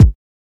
round bass drum sound a sharp key; kick drum samples

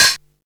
hi hat g sharp key

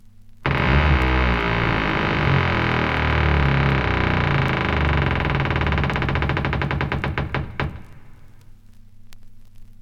heavy creeky door closes

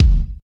focused bass drum e key; kick drum samples

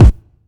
hot kick drum single hit a sharp key; kick drum samples

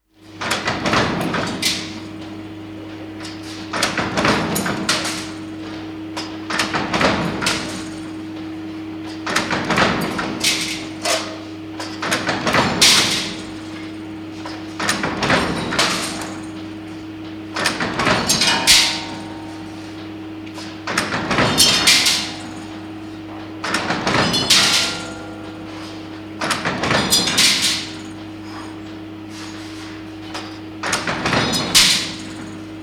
sheet metal cut by guillotine

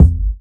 tight low end kick single hit g key

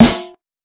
sizzly snare sample c sharp key

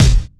original steel kick drum sample f key; kick drum samples

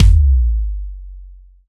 ambient bass drum sample a sharp key; kick drum samples

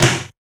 present snare drum b key

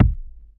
small reverb kick drum one shot g sharp key

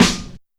tight lows snare drum sound D key; Duration 0:01; Channels Mono; snare drum samples

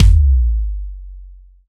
ambient bass drum sample a sharp key; kick drum samples

